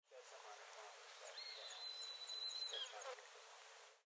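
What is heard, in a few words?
Gray Hawk Immature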